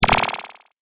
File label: Cartoon Magic Spell